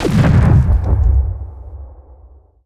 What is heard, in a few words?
Ship Getting Shot